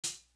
Hat, Closed Hats

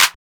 Clap; Dj Vybe

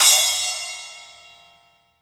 8 inch, Crashes & Cymbals; 8inchm

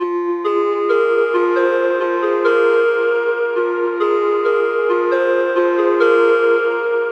melody lead 135 Fm

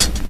HiHat

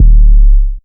Raw 808

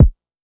Kick

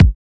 kicks

